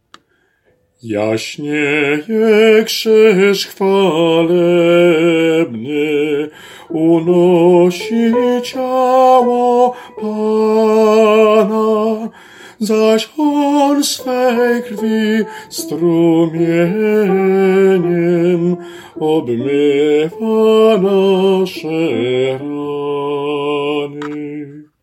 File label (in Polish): Sopran; Jasnieje krzyz